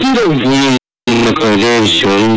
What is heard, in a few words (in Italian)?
tiro; tiro a voi mò core giù